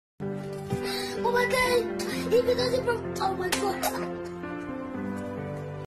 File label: black boy crying with knife; audio meme